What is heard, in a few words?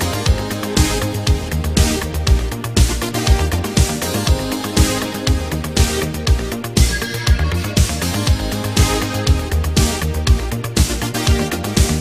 dragons legend loop; sven; ragemap2022